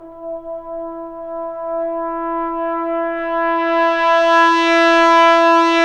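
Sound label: trombone; Roland L-CDC-01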